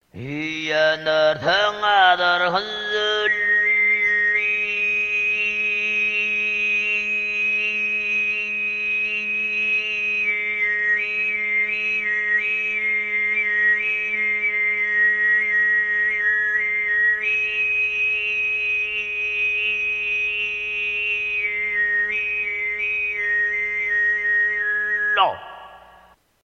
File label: throat singing